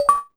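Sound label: achievement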